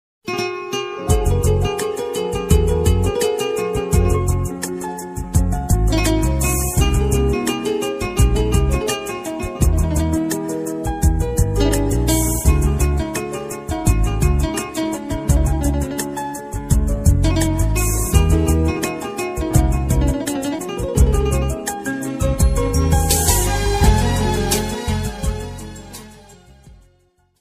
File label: grustniy muzika dlya zvonok telefon music for phone call